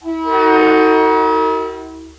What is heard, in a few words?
klaxon, Train; GP38